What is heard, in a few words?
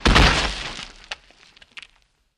Body Falls On Dirt